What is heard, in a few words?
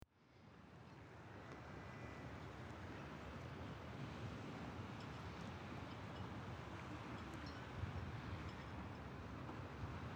quiet moment; lesconil2025